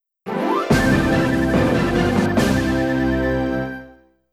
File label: Contratulatory Message; Wii Room (Theater Update) Soundtrack; Wii Channel